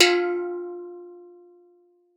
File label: steeldrum F3; Xsample VOL-4